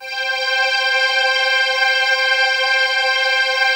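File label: chord pad, Pads; Best Service; XX Large - Pads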